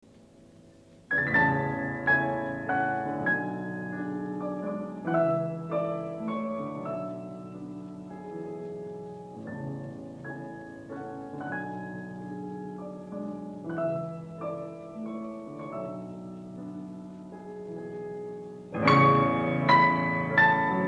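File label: Variation IV - Poco allegretto, piano; MICHELANGELI; BRAHMS; Variations on a theme by Paganini, Op. 35; Book 2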